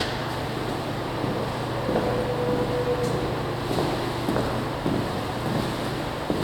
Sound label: humming